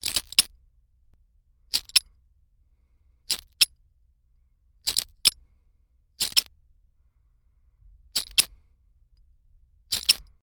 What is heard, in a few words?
Torch Striker